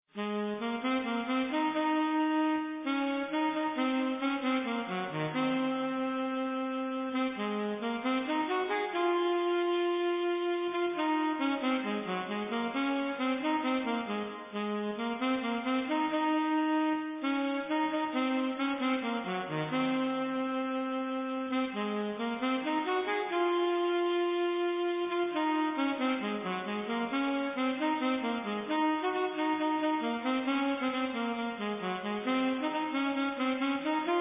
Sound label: salsa